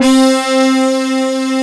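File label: mix brass C 3, funk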